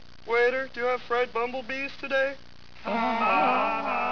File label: bees